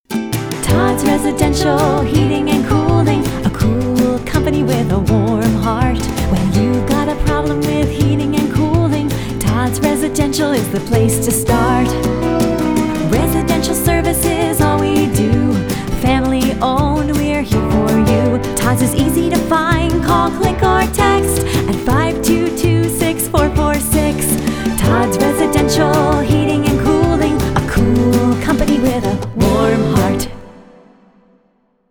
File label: residential jingle; todds